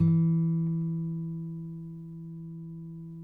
NYL E, FLAMENCO NYL; Spectrasonics; Hans Zimmer Guitars VOL-2

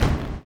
STOMPER; Blues Soul; STOMPS; Spectrasonics Vocal Planet

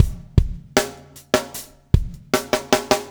SLICK

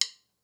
Stick; The Roots Drumloops

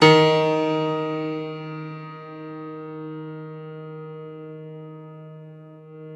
Bosendorfer; Optical Media International - Sonic Images Library

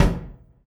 Kick, drums; Sonic Foundry (Sony Creative Software); Drums & Drones; Construction Kits; Ventria Kit

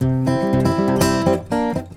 GUITAR 120; Best Service Advanced Media Trax Modern Composer

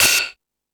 Fusion Stix, E-MU Pro-Cussion

